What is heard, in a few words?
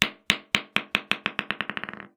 Snooker Ball Bounce; Balls